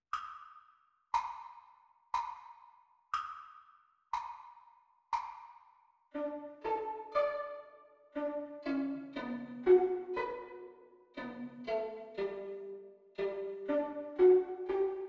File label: Mandolin Duet Practice Speed